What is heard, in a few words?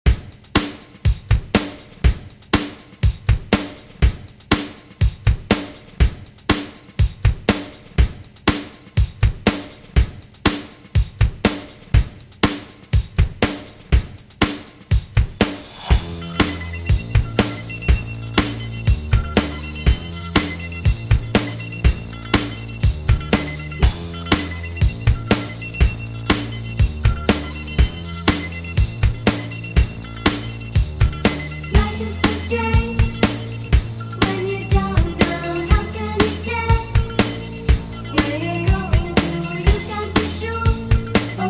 80s Dj Intro Outro 121 Bpm